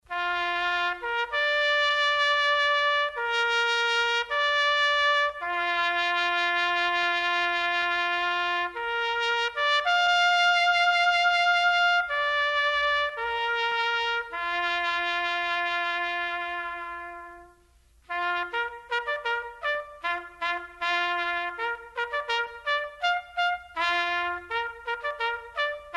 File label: Church, Bugler; Bugler and Drum Core